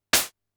crash, Drum Machines, Formanta Radio Plant Polivoks